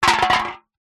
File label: drink soda can empty drop knock over wood deck; zapsplat